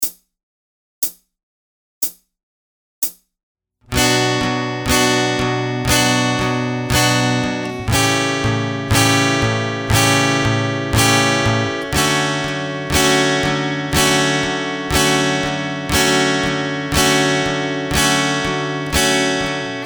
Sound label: Piano Strumming Pattern capo 2 SLOW; Ultimate guide to strumming book